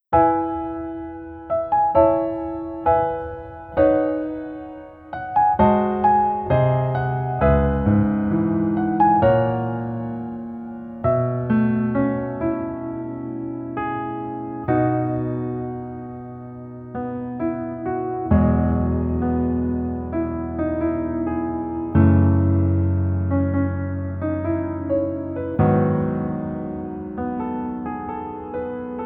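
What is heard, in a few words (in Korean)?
Piano version 멜로디 MR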